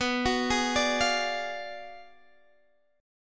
B Minor 6th Sharp 11th B

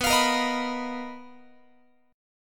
B Major 7th Flat 5th B down Guitar; strummed

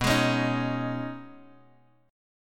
B 6th Add 9th B down Bass Standard; B6add9 strummed; chord shape; JGuitar

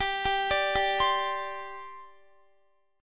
G Suspended 4th, Guitar; Gsus4 arpeggiated; x,22,19,19,19,20

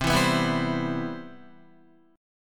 C Major 7th, Guitar

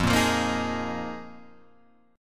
Eb Suspended 2nd Suspended 4th

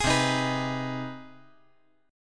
C Minor Major 7th Sharp 5th, C

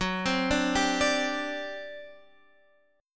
G Major 7th Suspended 4th, Gb

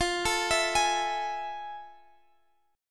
F Major 7th Sharp 9th, guitar chord; Fmaj7#9 arpeggiated

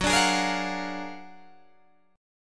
F sharp Augmented Major 9th F sharp Guitar Open D

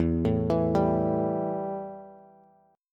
E Minor Double Flat 5th, Bass; 12,12,14,12; arpeggiated; JGuitar

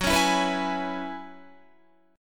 Gb 7th Suspended 2nd Suspended 4th, Guitar

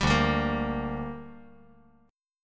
D Suspended 4th Sharp 5th D down, chord

